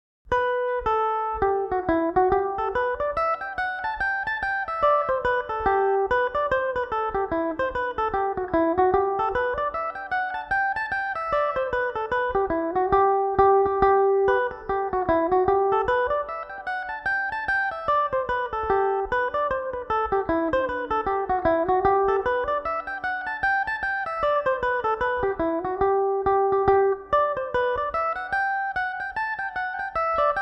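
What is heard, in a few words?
G major belmuse, irish mandolin; Youghal Harbour; Belmuse EM-190 electric mandolin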